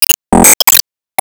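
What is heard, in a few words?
Sound Effect System Result Drop Item Rare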